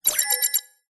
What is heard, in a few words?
science fiction control panel beep alert